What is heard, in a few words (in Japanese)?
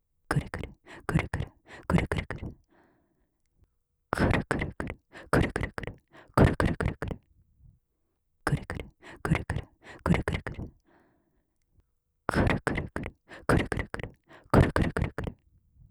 クルクル速い; ASMR; オノマトペ; 上官